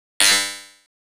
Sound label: Arcade robot sound 8Bit